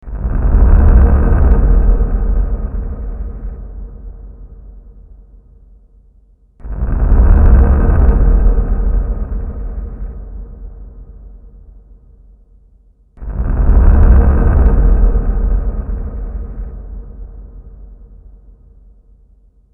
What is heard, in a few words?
Low Ambience; The Legend of Mata Nui; Game Music; Console Games